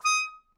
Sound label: Melodica Short; Short Notes Chromatic